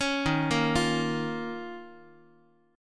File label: D sharp 7th Suspended 2nd, Guitar; D#7sus2 arpeggiated; JGuitar